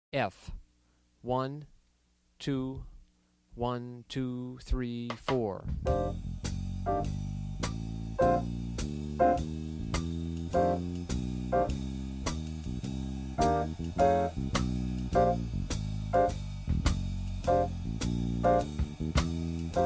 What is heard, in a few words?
f blues